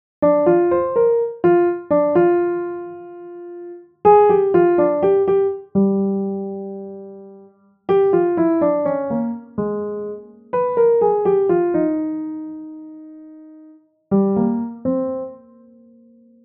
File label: bossa nova